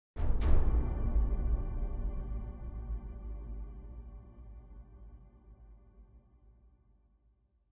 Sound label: Big Drums; Music; Citdel; frozen flame; windows gamerip 2022